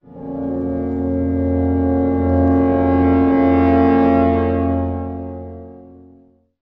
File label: brass accent; Orchestral Loops